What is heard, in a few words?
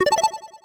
menu; Fur-War